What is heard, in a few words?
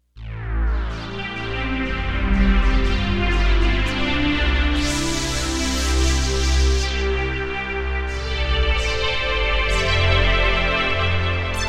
phased strings